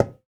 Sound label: Tablas; Spectrasonics; Supreme Beats; World Dance